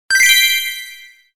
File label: kiraahhn